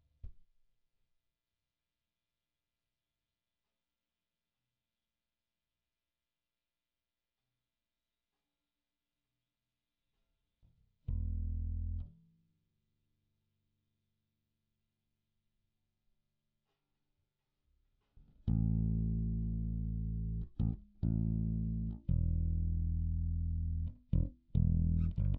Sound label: Bass DI